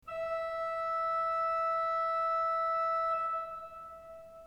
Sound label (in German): ton, orgel